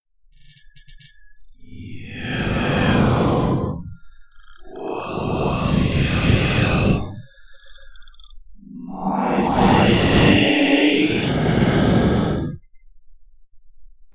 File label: Pain